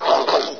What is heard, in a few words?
con idle; source engine; hl1; video games